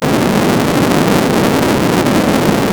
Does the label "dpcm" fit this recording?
No